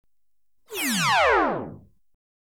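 High Power Phaser Weapon (Single Shot), Electronically Generated Sounds; BBC Sound Effects Library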